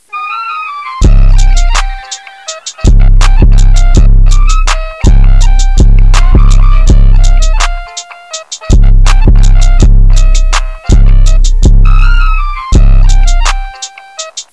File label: drip car